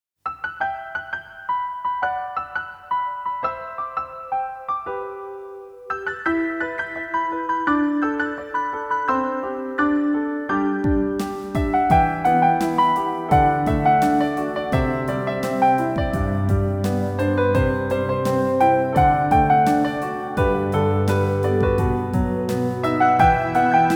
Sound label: instrumental version